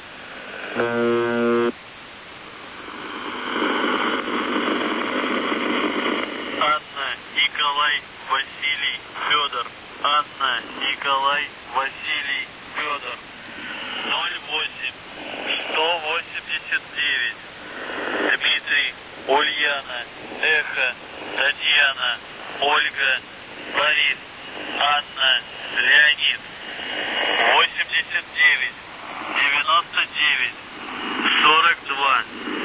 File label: buzzer usb msg